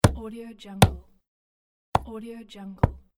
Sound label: organic impact